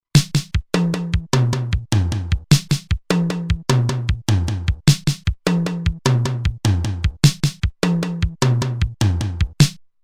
Fill In 4-4, Roland TR-505; Factory Rhythms

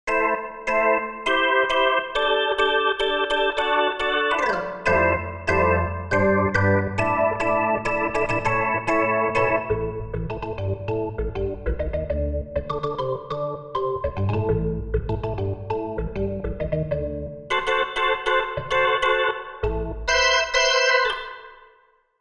Block Organ, Korg MSC-1S; Korg MSC-1S Variety

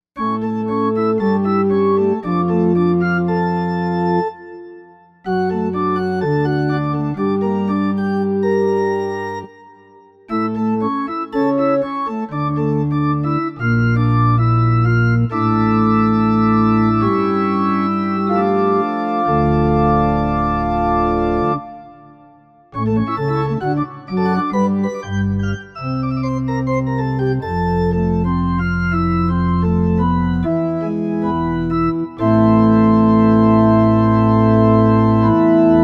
Pipeorgan, Korg M1; Factory Patches

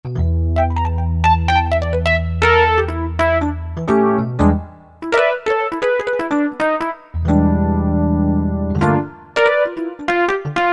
EP Dynomod, Yamaha TG77; Factory Presets

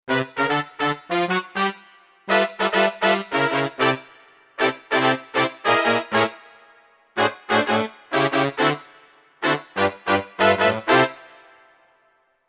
Hip Brass, Kurzweil K2000; Kurzweil K2000 V3

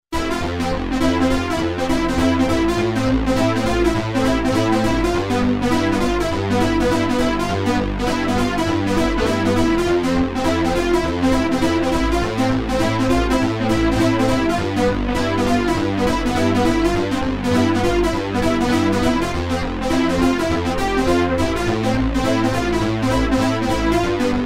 MemoryMoke, Roland V-Synth

